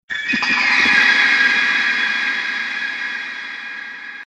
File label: door